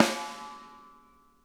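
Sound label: snare edge; Sonic Reality; Interactive Drum Kits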